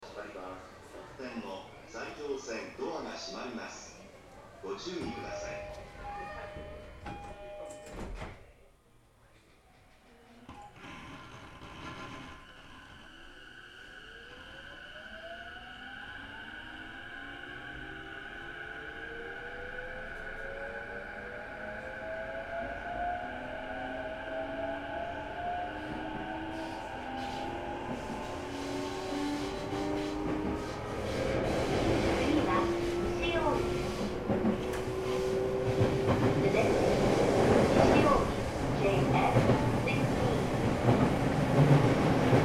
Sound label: sotetsu 12000, train